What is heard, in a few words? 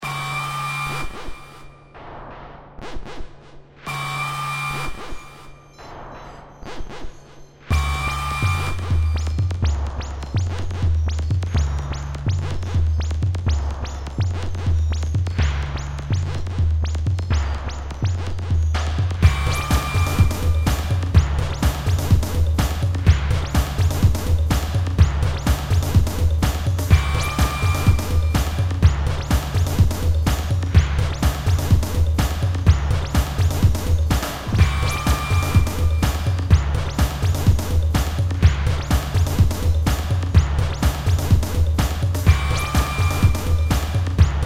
noise level